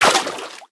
water, human step; Footsies

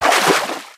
water, material actor step; water02gr; Soundscape Overhaul

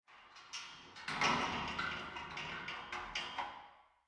ambient urban, soundscape; Soundscape Overhaul

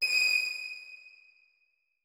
notes, keyboard; Hideout Furniture

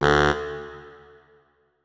notes, keyboard saxophone; Hideout Furniture

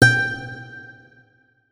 notes, guitar; Hideout Furniture

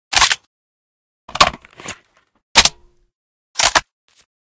reload empty, weapons sv98; SV98 TRG42 Reanimation; librarian

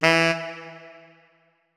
notes, saxophone; Hideout Furniture

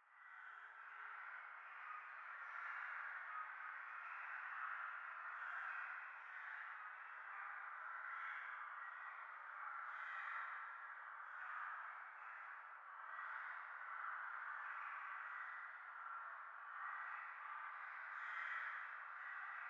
very far, ambient blowout; NPCs Die in Emissions

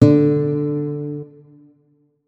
notes, guitar; Hideout Furniture

